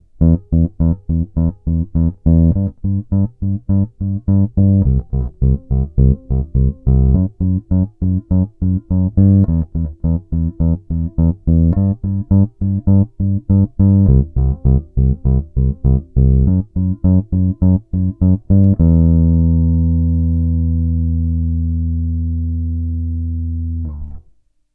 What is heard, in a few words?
Neck Rolloff